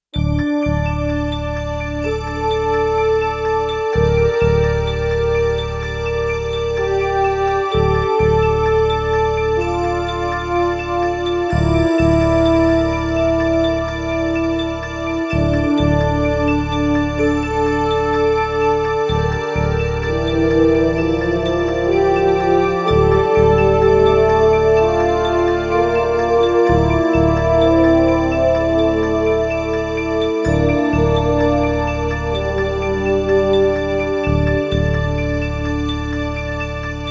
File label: Tension